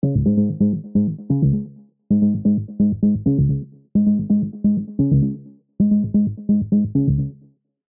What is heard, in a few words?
KORG MS 20 V Dist TUBE Bass Massive Sound System; Arturia